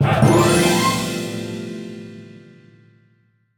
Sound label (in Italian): fanfara; Loot Hunter